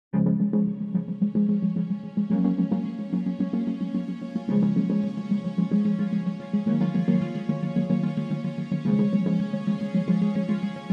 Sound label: No Piano